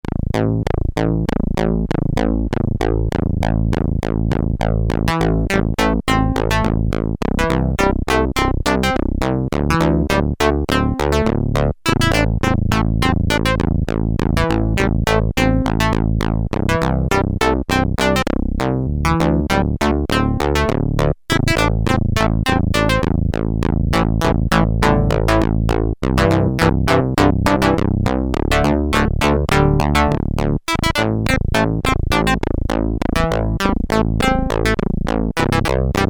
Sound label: Synth Plectrum, Moog Memorymoog; Factory programs